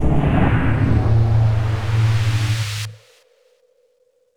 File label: Combo Sting